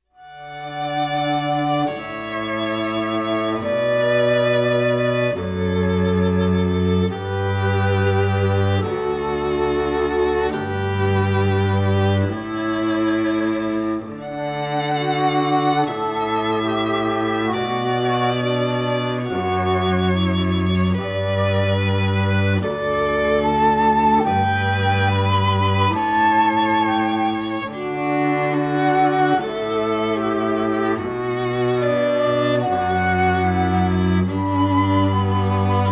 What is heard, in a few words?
Flute Trio; Pachelbel